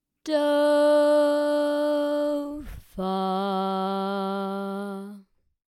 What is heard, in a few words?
Do Fa in C Minor